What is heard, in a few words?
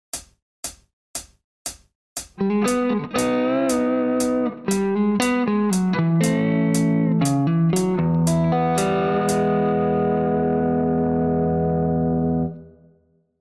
lick